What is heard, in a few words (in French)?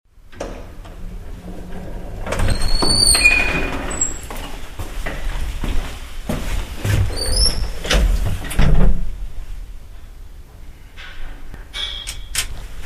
Ambiance ascenceur; bruitage